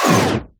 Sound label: Scratching FX